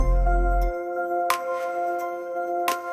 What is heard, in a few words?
notification